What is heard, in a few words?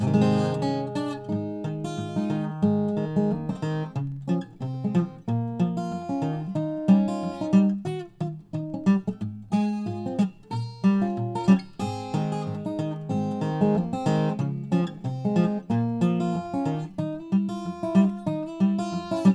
1928 Dobro; James E Wood